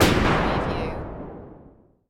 Retro Scifi Hit

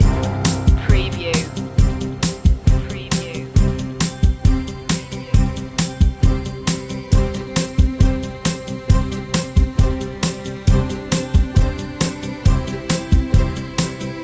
big celebration loop

